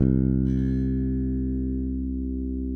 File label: SPEC SUSTAIN, Bass; C2; Sampleheads; Will Lee Bass Library